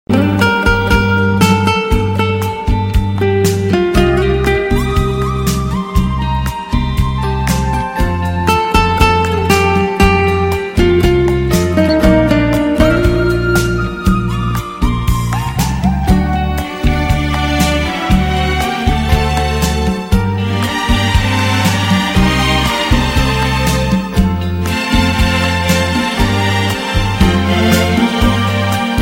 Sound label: guitar